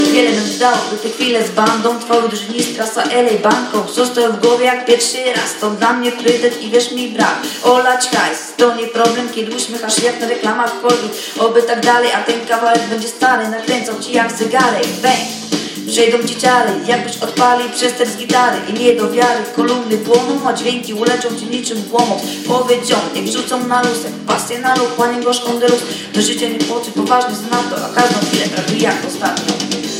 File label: rap